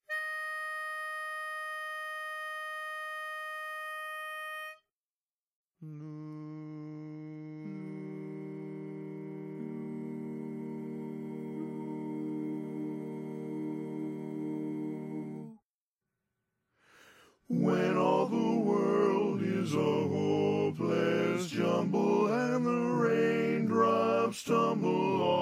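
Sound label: Baritone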